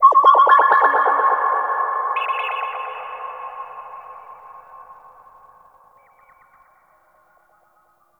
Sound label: Mine Drone Underwater Idle Vocal; Warframe; Grineer